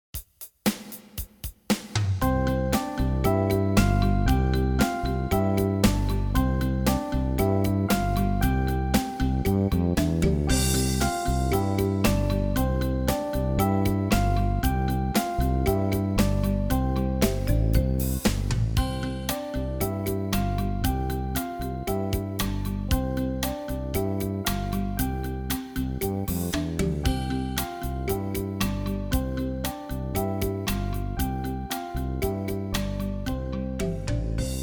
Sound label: C Pentascale Warm Up